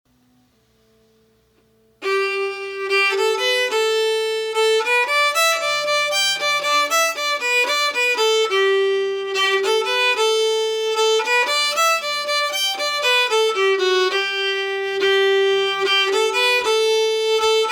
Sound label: Kesh Jig